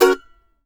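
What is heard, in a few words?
mandolin F# major, Country; Sampleheads; Whole Lotta Country 1 & 2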